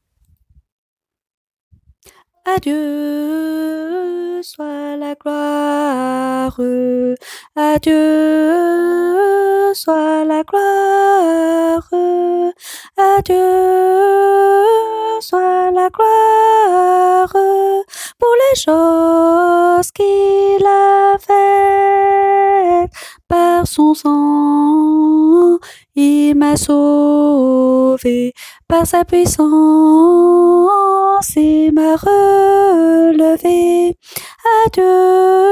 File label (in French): alto